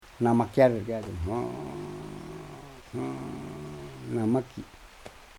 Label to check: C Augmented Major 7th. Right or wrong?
Wrong